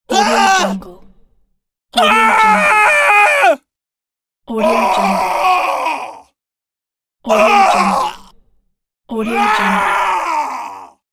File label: male scream pack